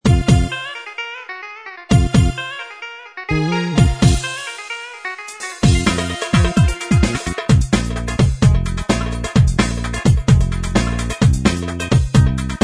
style cieftetelio, gem genesys pro oriental

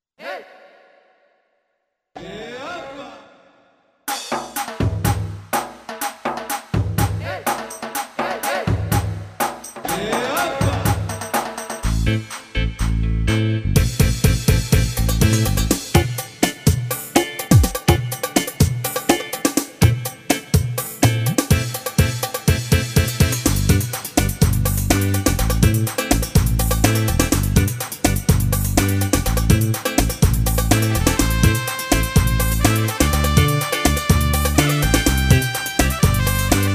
Ketron Vega EX 4

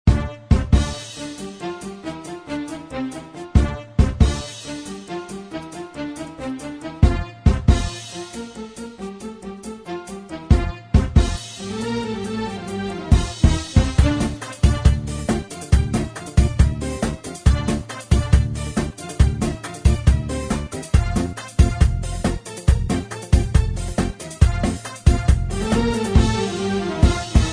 genesys pro oriental

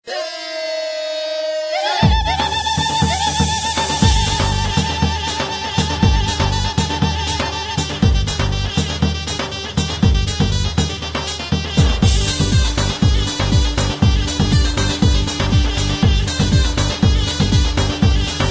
genesys pro oriental

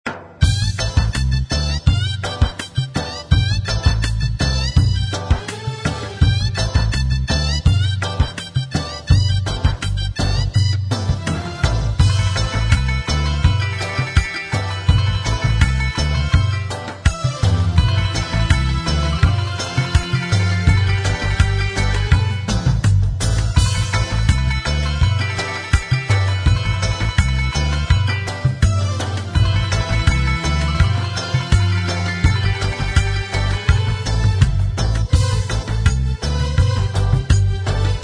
genesys pro oriental

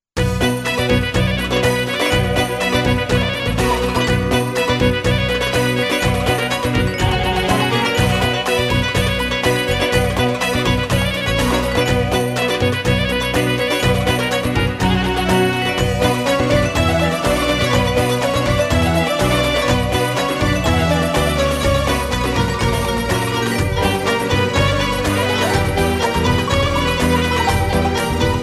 Ketron Vega EX 4 fenjan

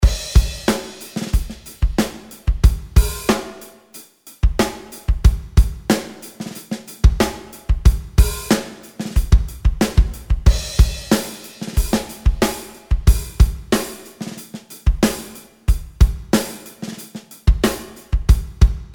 pop super kit, ketron audya

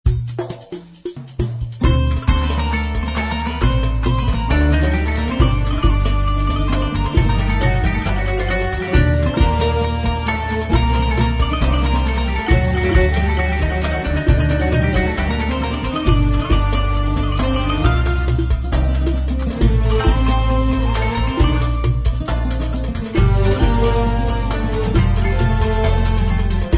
genesys pro oriental

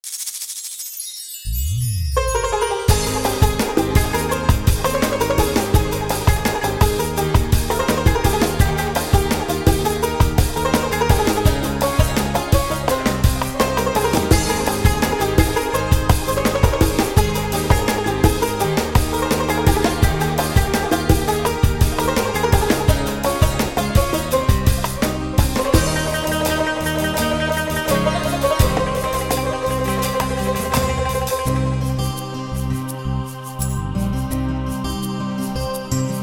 beat; bk5 90sbeat

